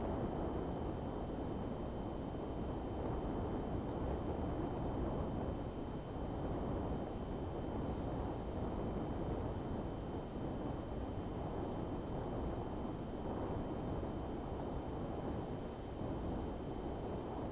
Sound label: Whales; SeaSnake